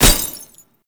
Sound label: Tank Weakpoint Impact; Enemies; Gameplay; Lasrian Tank; Warframe